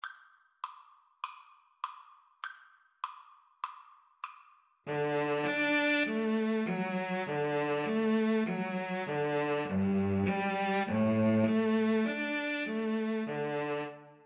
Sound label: battle hymn, violin cello; Violin-Cello Duet